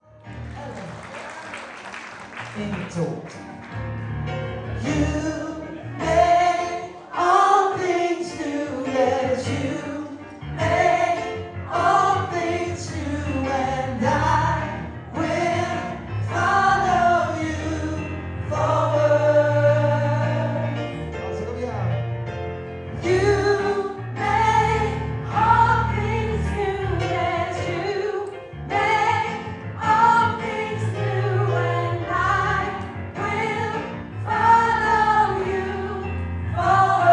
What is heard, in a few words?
moving forward alt